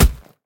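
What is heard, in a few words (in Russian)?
слабый удар по пустой коробке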